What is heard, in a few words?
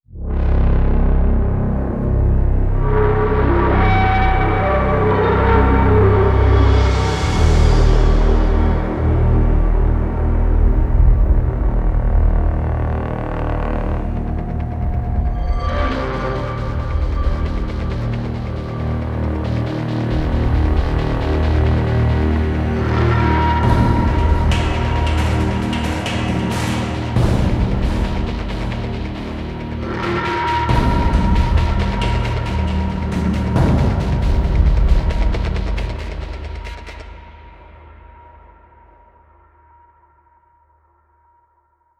Ship Crash; Black Sky